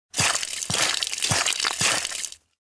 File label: shovel dig hole; gensen; anemoi